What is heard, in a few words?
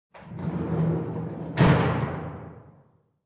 Huge Steel Door closing